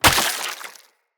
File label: Short Sword On Killed; Weapons; Player